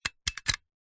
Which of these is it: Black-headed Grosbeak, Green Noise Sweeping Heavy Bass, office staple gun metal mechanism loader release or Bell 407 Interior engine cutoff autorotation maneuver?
office staple gun metal mechanism loader release